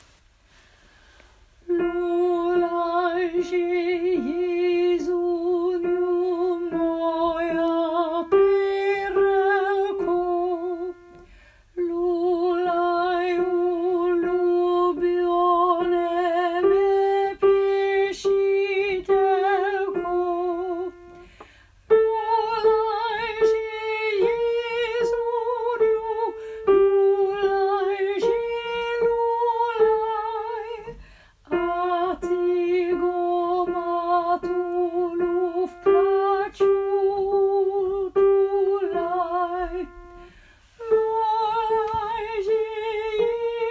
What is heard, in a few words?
Lead